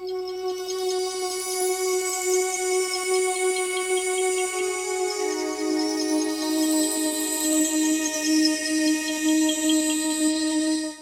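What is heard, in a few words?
synth, Hip Hop and R&B Drumloops; Best Service ProSamples vol.01; AKAI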